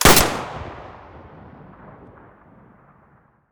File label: muzzle hpsa stereo, weapons